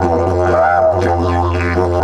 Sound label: 120, Didgeridoo